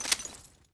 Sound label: colt reload clip in, weapons; svencoop; ww2projekt